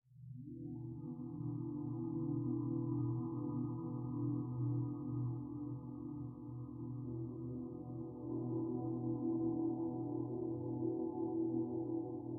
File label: Level I Pad